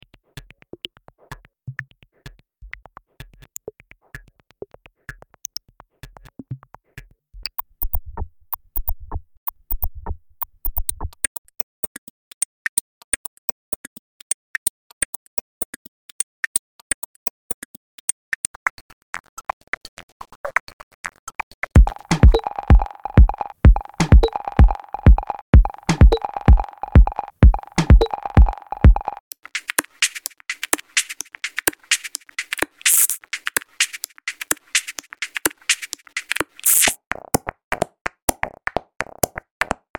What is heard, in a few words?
Clique Loops